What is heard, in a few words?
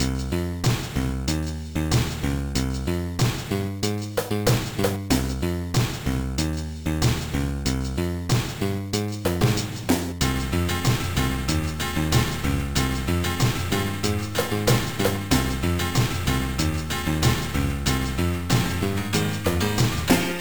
beat; salesman